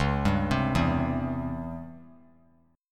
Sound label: Db Minor Major 7th Flat 5th Db down; DbmM7b5 arpeggiated; JGuitar